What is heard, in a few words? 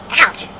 ouch; Sound Library 2000; Wayzata Technology